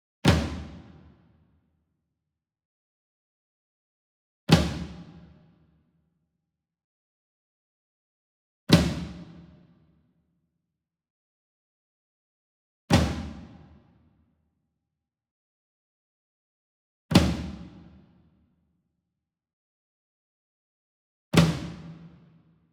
Single Drum Tom Concert Flam Hard Beater Medium; boom library; Cinematic Strikes Construction Kit

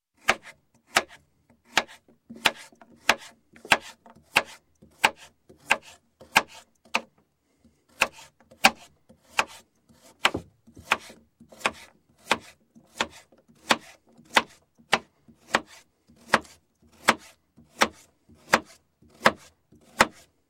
Cutting, Knife Slicing Vegetables, Food; Sound Ideas 2000 Series

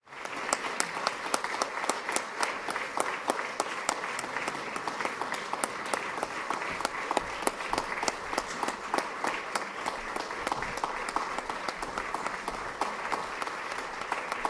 applause; NS Studios - ultra sound collection; human

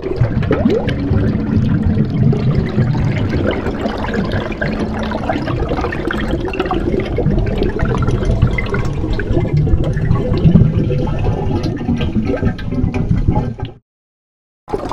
water blubb mix; best service Blue Box; water fx

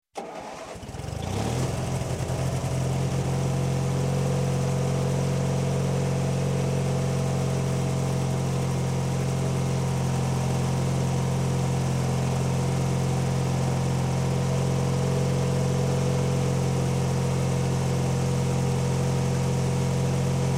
Tractor, Small Start, Idle, Stop, Industry; Farm; Sound Ideas 2000 Series

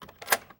Hospital Door Lock; Medical; Blastwave

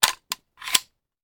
Machine Gun Foley; Sound Ideas; Guns

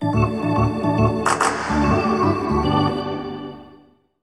Hockey Organ

